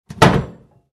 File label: Kitchen oven oven door close; cooking